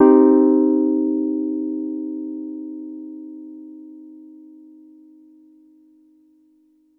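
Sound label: Piano Wurl minor med; TrashLibrary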